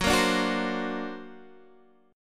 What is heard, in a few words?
D Augmented 7th F sharp, guitar